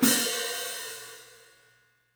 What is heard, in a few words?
3D Drums, TIGHT ZILDJ; 3D Sound